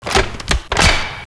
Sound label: shotgun cock heavy; valve